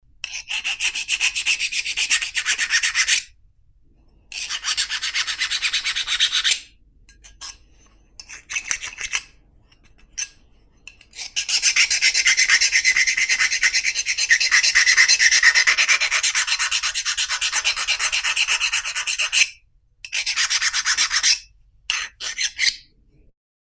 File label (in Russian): Napilnik